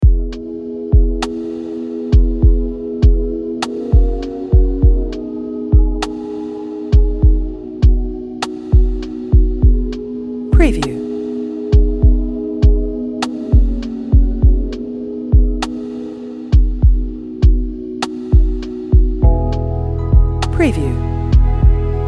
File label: Chilling Calm Drum Beat LoFi Music